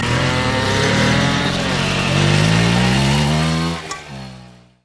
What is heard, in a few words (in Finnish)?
mopo